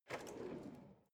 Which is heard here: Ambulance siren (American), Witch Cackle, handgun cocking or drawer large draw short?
drawer large draw short